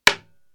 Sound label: Wood Hit